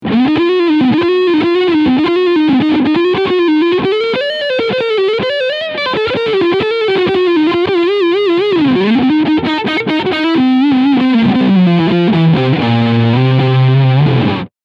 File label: ESP E II Eclipse BM Front Humbucker Overdrive